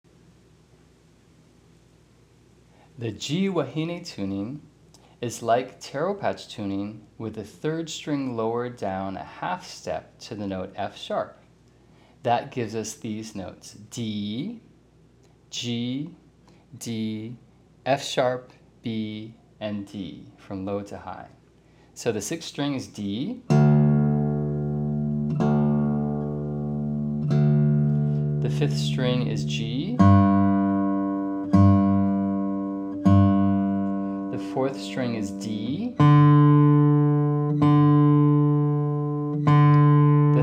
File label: G Wahine tuning, guitar